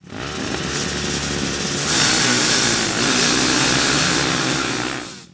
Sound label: start